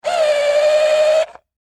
Industry Electric Drill Tighten Screw Whine Stress; Drills; Tools